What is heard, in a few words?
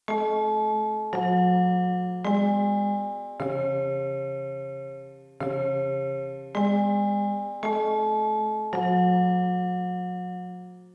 chime